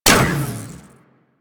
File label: Minigun Fire Start; Spec Ops Minigun Agent